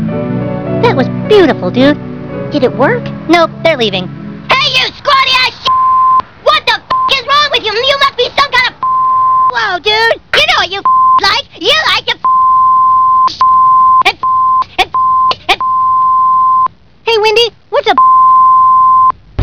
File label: ending